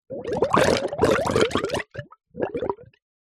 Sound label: water gurgle bubble could be blocked drain; nature